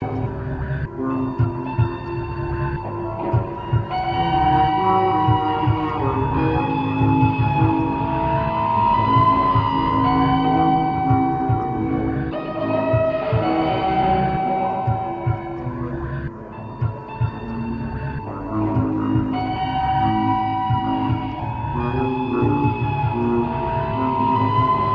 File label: jazz